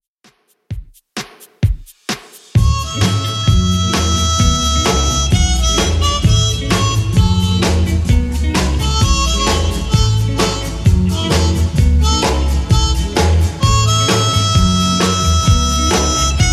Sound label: 60s Redrum 130bpm